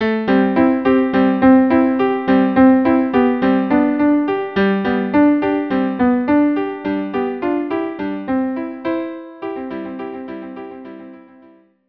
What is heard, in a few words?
violin duo; Dreidel song